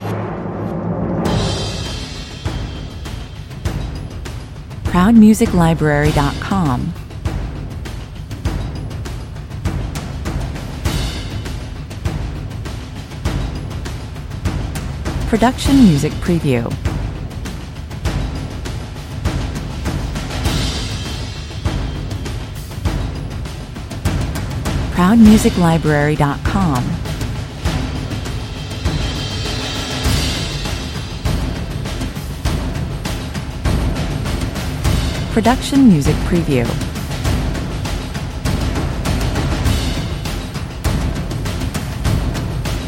percussion mix

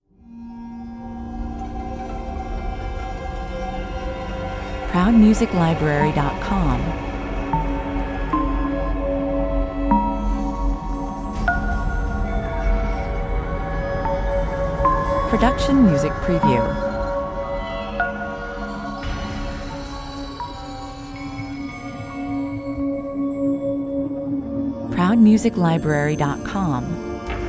orchestral

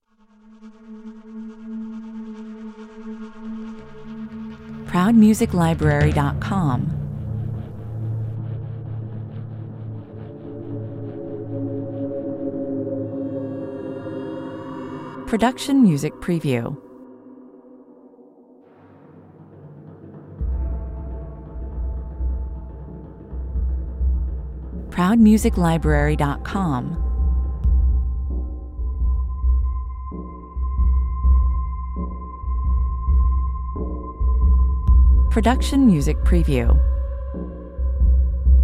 120 bpm

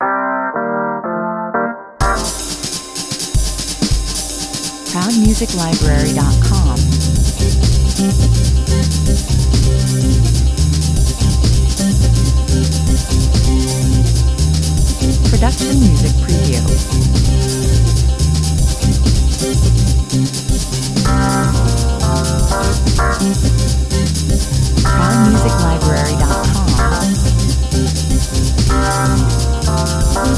seven eleven 126 bpm

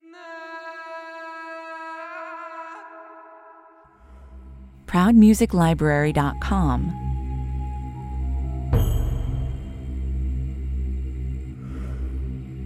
cairo street chase 125 bpm

